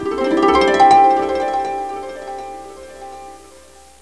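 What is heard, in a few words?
HARPE C O G, Instrument; MUSIC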